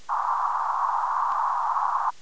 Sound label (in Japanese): 音色的高さ fc=1kHz; 音の高さ